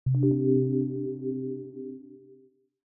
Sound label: drag